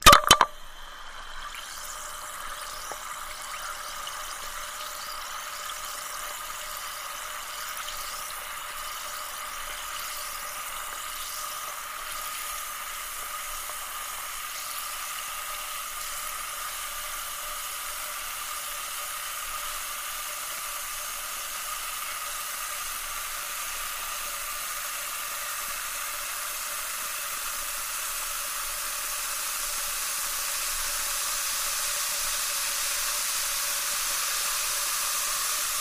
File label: Ambiance Fizz Swirl, bubbles